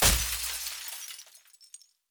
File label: crystal break; Hollow Knight